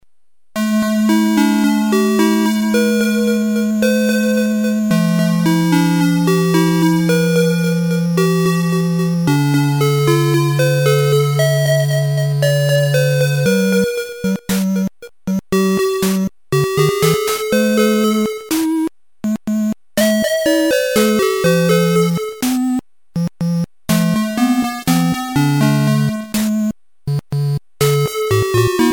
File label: Game Ending Music